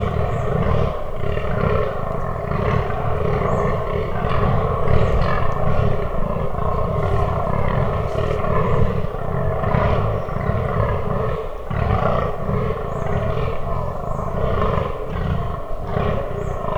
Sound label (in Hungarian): közelről barcognak, dámszarvas; termeszetben